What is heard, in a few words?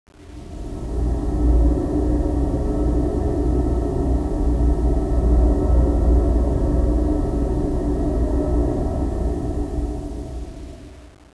strange